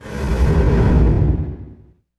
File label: IMP FLAME, Cinematic Impact